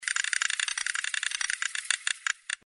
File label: rotating